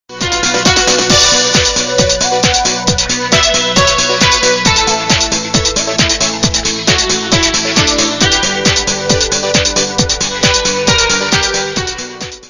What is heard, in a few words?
rock ringtone